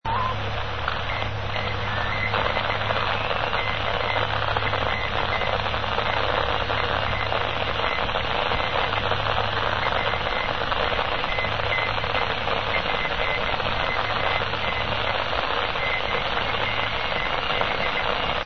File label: cw; ptt surabaja radio 'pkd'; rainer brannolte, d